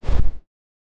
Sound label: fast tight whoosh; speed